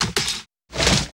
ROPE CUT; Total Control - Action Cutz; REACTION

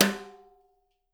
TIMBAL; Spectrasonics; Liquid Grooves 1 & 2; BR.TIMBALES

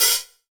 HH, Drum Mashines; EdgeSounds

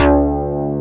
synth bass; East West - Global Sounds

